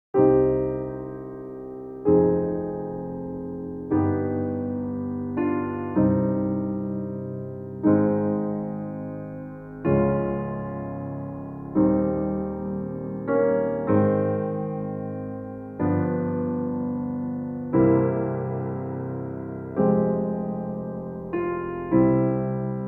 Chopin, Prelude No 20 in C Minor, with RH octaves removed